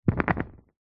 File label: nature fire flame candle blow out